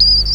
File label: polyphonic call; Rondone